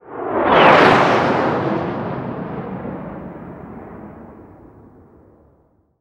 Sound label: plane